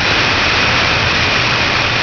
steam; de coldfight

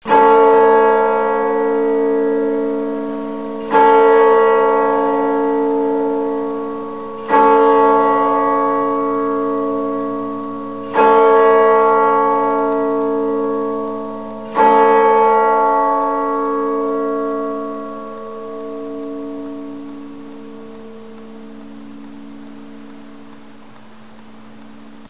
bell

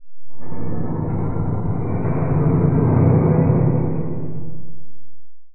rocket groan

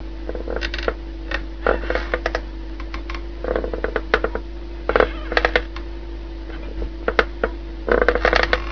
swing

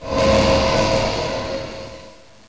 life drain; warcraft3

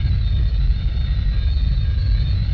rumble whine; dm achluophobia

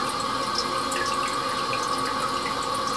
buzzer; cs paranoia; podval

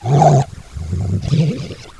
pit drone alert; svencoop; sectore

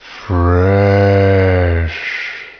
zombie; de compclub

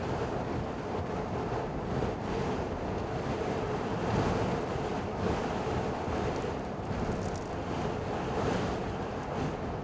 wind desert

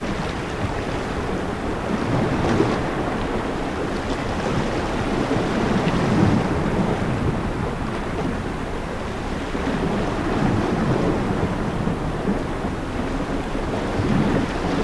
waves; flatshaded

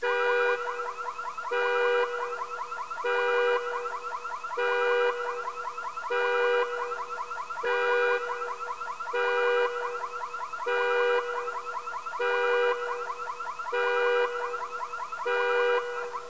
car; de tabocas

